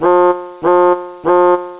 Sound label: alarm